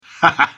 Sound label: laugh; Portal 2; cavejohnson